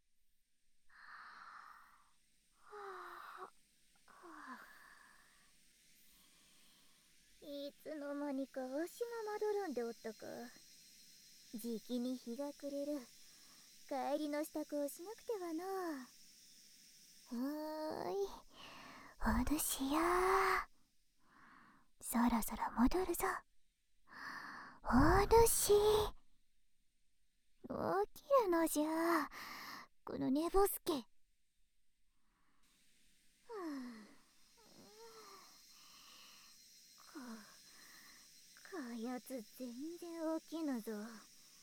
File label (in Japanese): 耳かき; お狐様と耳かき